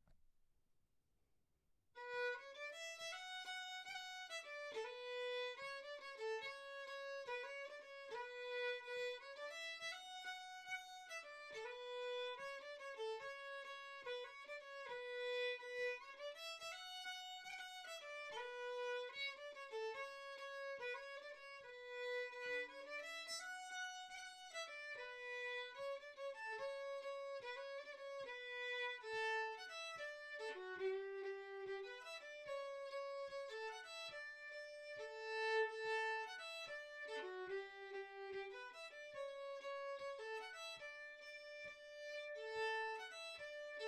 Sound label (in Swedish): schottis; Böl-Olles